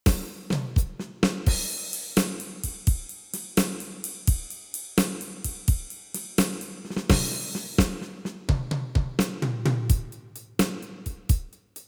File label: beat rock, Startone MK-300